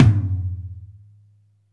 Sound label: INSIDE HI, TOMS; Back In Time Records; Big Bang